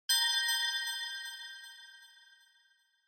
Bell Accent Low

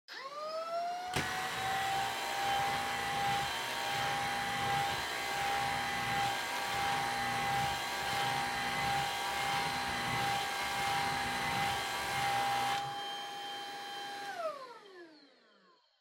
Vacuum Cleaner Vacuum Floor Low

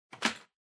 Vacuum Cleaner Pipe Remove; 掃除機; パイプ 外す

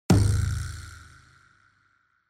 Onoma Impact

